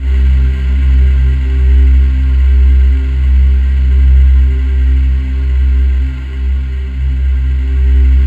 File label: C2, Synth Leads